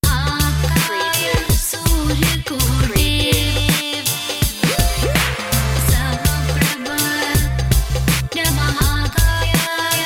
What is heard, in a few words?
bollywood seduction